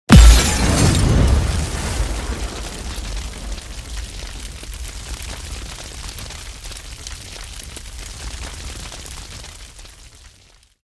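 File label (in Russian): взрыв с огнем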